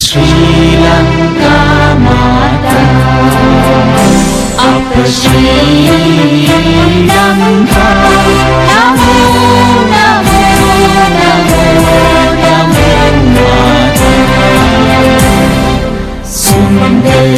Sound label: national anthem of sri lanka ringtone